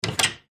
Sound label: door